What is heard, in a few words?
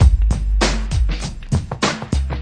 98 deep groove, drum loops and breaks; 091 to 100 bpm